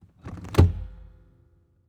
Transportation Seat Full Size Van Seat Front Passenger Seat Adjustments Forward